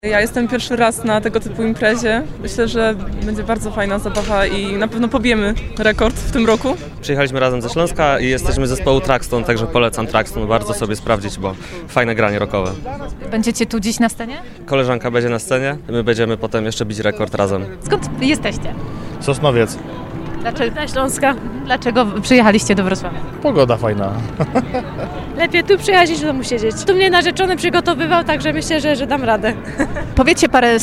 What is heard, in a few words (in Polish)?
rekord gitarowy; gitarowy rekord swiata 2023 we wroclawiu